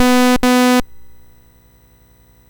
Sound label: BASIC sid MOS6581R4